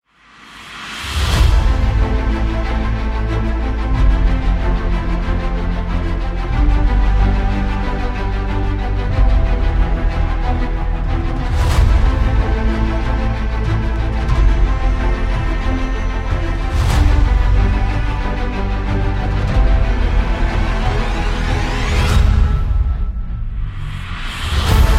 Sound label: The Epic Trailer